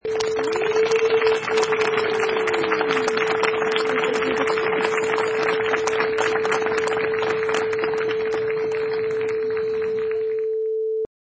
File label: Small Crowd Clapping